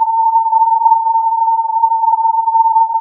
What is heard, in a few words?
filtered input